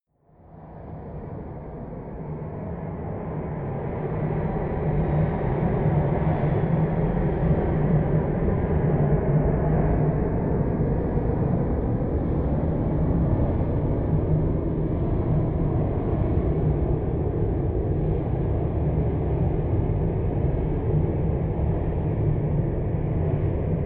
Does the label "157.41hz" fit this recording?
No